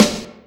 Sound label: snare, drums; virtual drum sounds